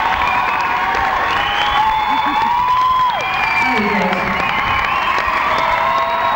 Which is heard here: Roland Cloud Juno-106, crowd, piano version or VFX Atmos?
crowd